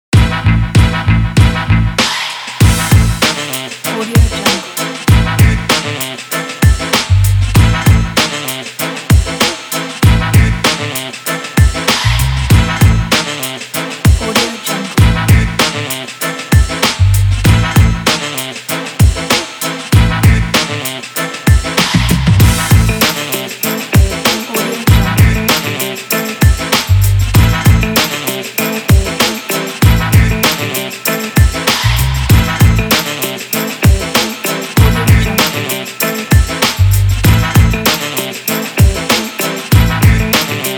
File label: this is hiphop; trending-music